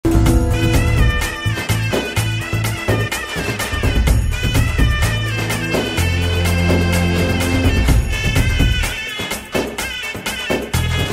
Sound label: movie ringtone; kantara